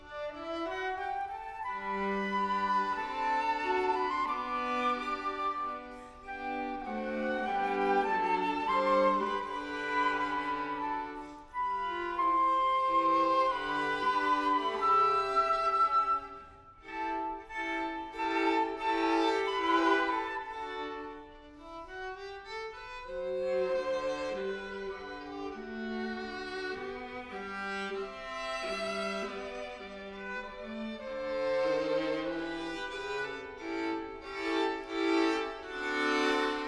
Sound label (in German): Serenade op. 25 für Flöte Violine Viola